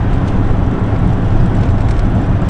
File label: tarmac